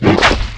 attack; timers

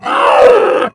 falling bishop attack

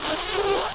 horn scaraba damage

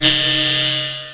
attack; apocalips

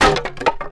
metaller die

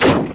sword fish damage

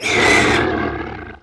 chimera die

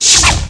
PIERCE hit; DK DRAGONIC

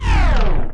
grenade fragment reset; night watch

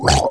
bloody murderer die

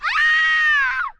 death witch die

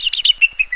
icewind die

